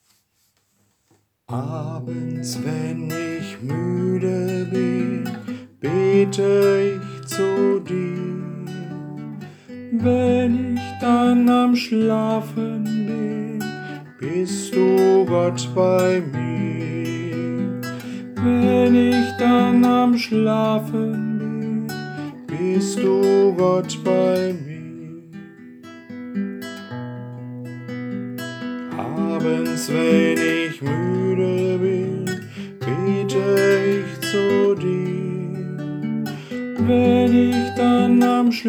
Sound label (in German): Abends wenn ich muede bin Gitarre